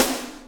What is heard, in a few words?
bongos, snares real